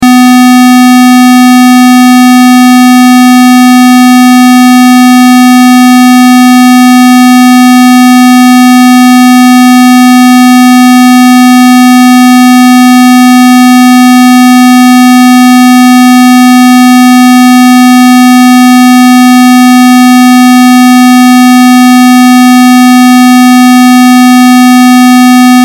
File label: Test Tone Square; Wave 250Hz 60 Secs 0Db Loop; Blastwave